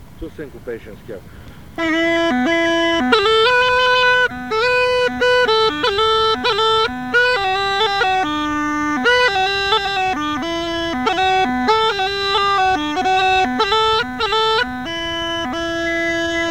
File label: syncops played slowly, Rodope Gajada